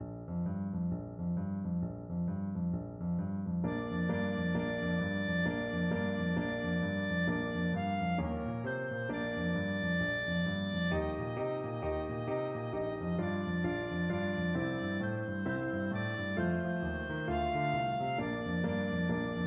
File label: jingle bells boogie, clarinet